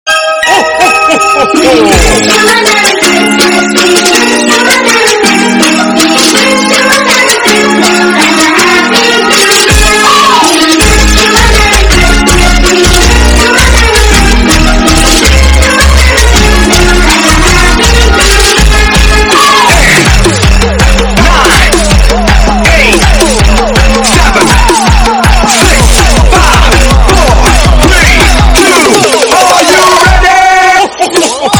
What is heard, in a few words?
trap christmas